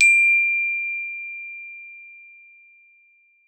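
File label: glockenspiel D4; Xsample VOL-4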